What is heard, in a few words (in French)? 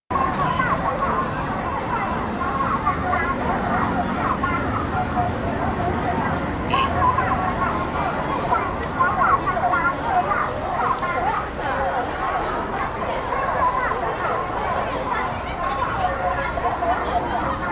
bruits de chine